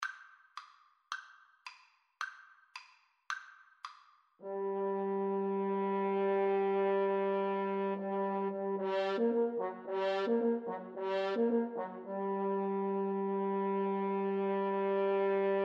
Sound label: French Horn Duet; Dvorak From the New World